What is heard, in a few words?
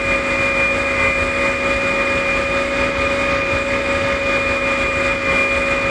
kv220 engine int charger loop, tanks; engines; War Thunder